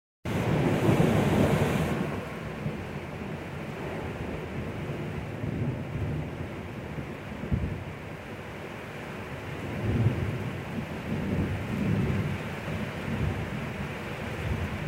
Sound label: Lightning Storm, Voice of Nature